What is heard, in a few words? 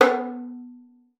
FING B3, VIOLIN; Xsample VOL-5